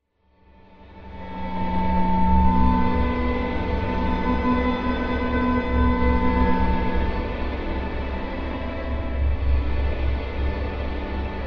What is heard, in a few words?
Music Eerie Sad Orch; orchestral